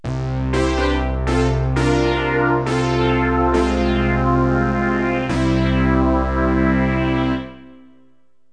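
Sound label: poly synth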